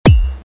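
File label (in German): Menü öffnen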